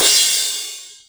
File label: Cymbals crash; Vengeance Samples